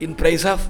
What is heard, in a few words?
MAN VX, Indian Traditions; USB Soundscan vol.45; AKAI